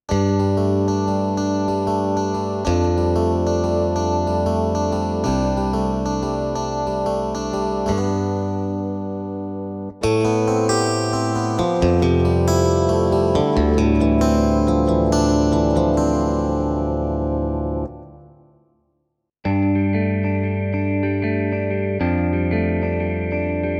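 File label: sound guitar electric; yamaha tyros3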